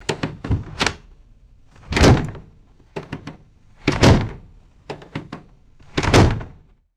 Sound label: wooden window repeatedly slamming shut; doors